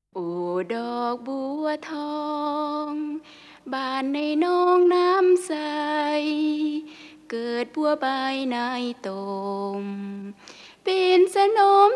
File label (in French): Chant laotien